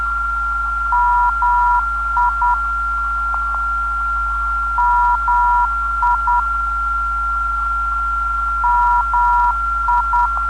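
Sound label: 355kHz, non-directional beacon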